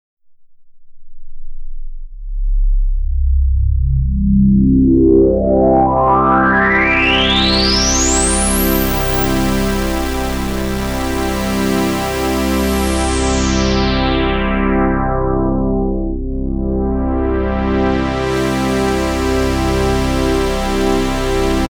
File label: hollow; fabfilter volcano2